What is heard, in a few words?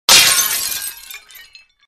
Breaking Glass